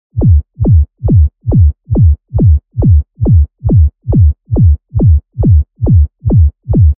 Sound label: Reverse Bass Loop E (138 BPM)